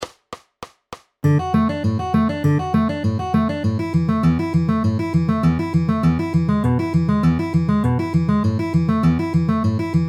D A E A outer inner ring ring with alternating bass at 200 bpm